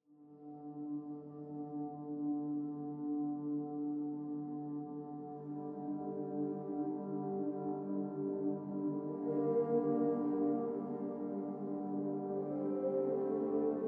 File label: Huge Drum Slams